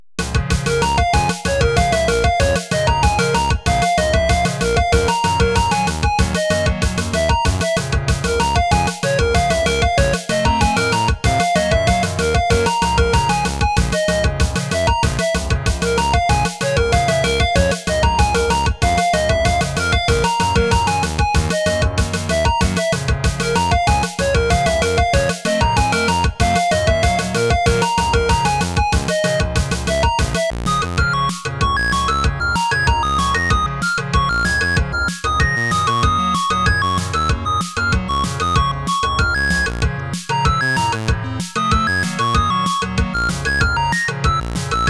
Bb Minor Blues

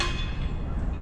Hammer

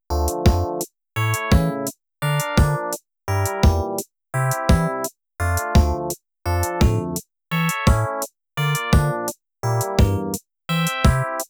D Minor Classical

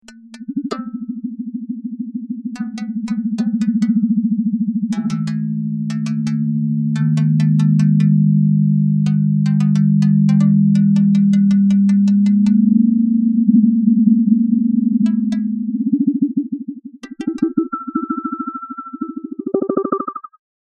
modified ks model

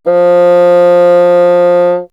Bassoon ff F3; dsp2008 sound library

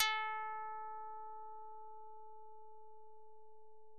karplus

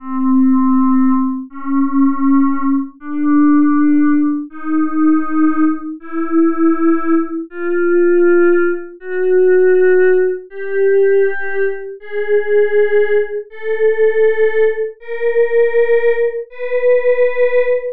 just vs pythag chromo sc

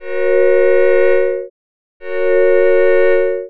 just Gb chord comparison

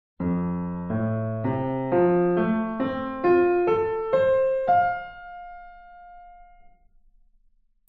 piano output

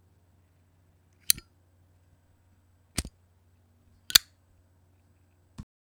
Zippo

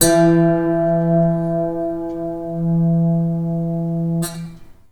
rstring

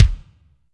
kick

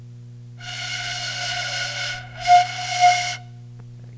plastic Flute

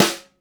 snare

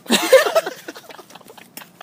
Laughter

